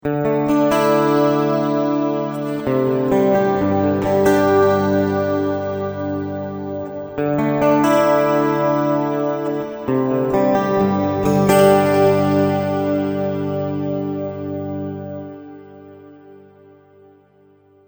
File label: Modulation